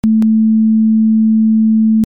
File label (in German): saegezahn 220 tiefpass